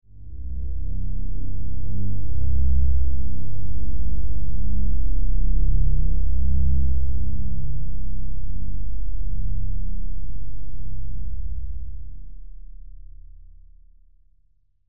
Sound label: Bass Earthshade C; manifest terra